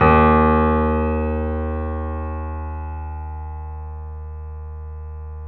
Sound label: D#, Piano; InVision Interactive; The Piano